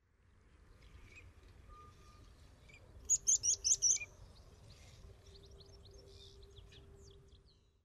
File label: meadow pipit